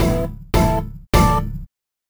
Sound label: Judging; Assembly Dojo